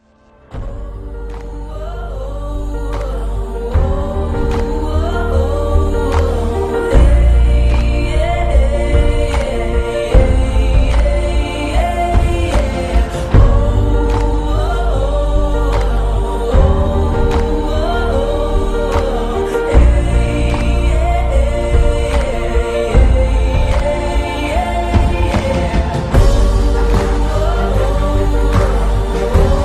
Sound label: English Sad Love